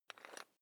electric drill bit fasten wrench turn medium

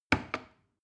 plastic button small click heavy slow

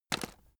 chocolates take short

